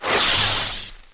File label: knife hit; light